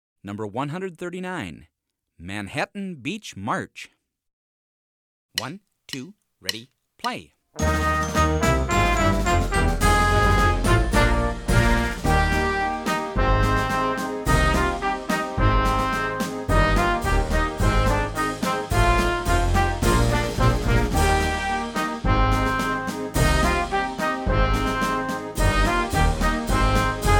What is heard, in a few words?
manhattan beach march